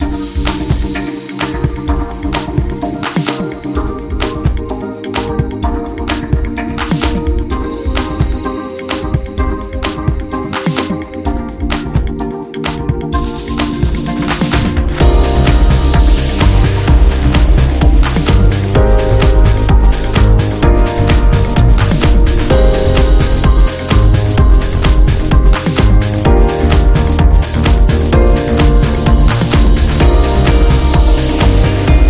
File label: Luxury House, corporate music; corporate music vol 20